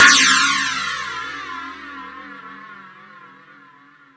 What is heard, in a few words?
Crash 2, drum machine